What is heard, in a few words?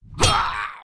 daemon attack; propack